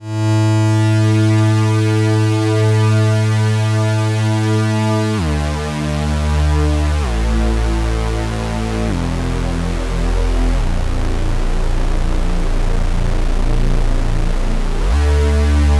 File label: better sqr